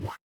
retro game power up

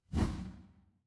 transition swoosh

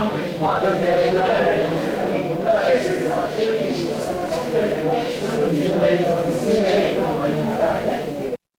crowd murmur

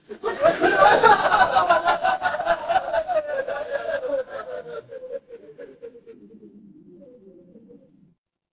crowd laughter

